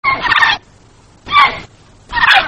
Heron Cendre; Ardeides; Le Héron cendré

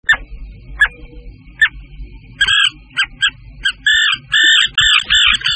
Sterne Pierregarin; Lariformes; Les Sternidés; Sternes, Guifettes; Oisellerie Galan

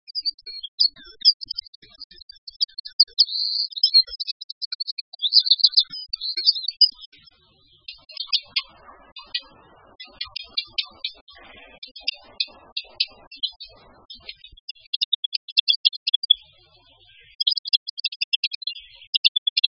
Troglodyte mignon, Passereaux; Les Troglodytés; Oisellerie Galan

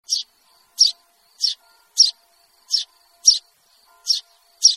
Mesange Charbonniere; Passereaux; Les Paridés; Mésanges; La Mésange charbonnière

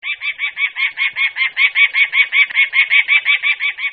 Faucon Pelerin; Rapaces; Les Falconidés; Faucons; Oisellerie Galan

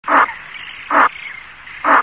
Spatule Blanche; Gruides; Échassiers; Les Gruidés; Lac de Puydarieux

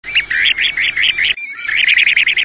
Gravelot Collier Interrompu; Charadriides; Le Gravelot à collier interrompu

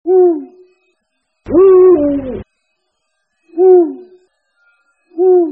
Hibou Grand Duc; strigides; Oisellerie Galan

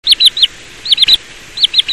Chevalier Sylvain; Scolopacides; Le Chevalier Sylvain; Oisellerie Galan

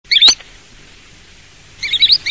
Chevalier Cul Blanc; Scolopacides; Le Chevalier cul-blanc; Oisellerie Galan

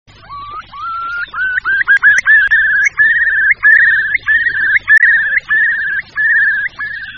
Courlis Cendre; Scolopacides; Le Courlis cendré

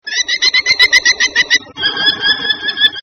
Faucon Emerillon, Rapaces; Les Falconidés; Faucons; Oisellerie Galan

